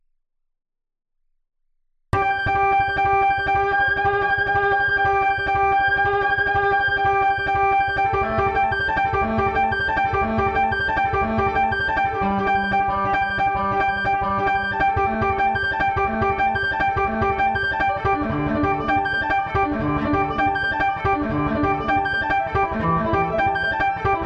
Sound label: String Studio VS3